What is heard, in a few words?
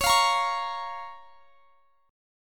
Db 6th; strummed; x,x,23,22,23,21